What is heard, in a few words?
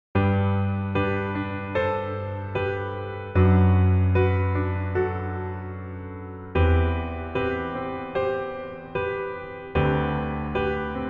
Piano